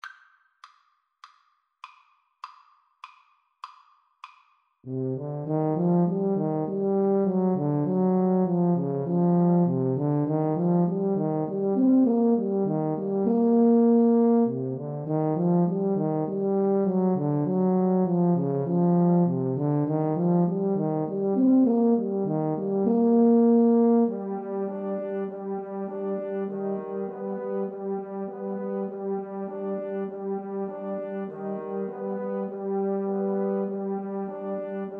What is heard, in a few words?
mountain king, 2 Euphoniums Tuba